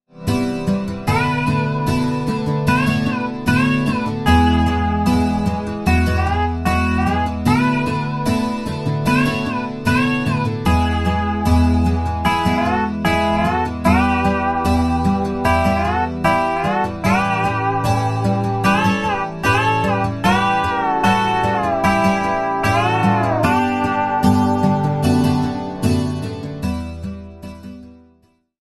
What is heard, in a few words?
AG Slide EG; MidiGuitar